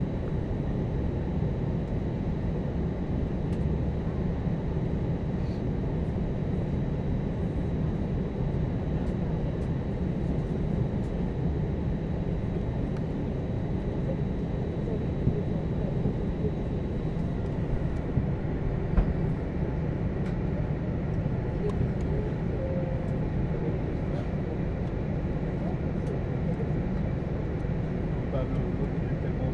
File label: Inside Plane In Flight